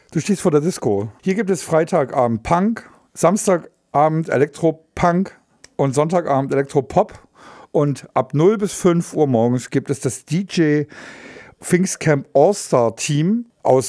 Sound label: DISCO